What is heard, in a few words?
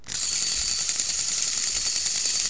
windup, house fun; KABOOM! - Wild and Wacky Sound Software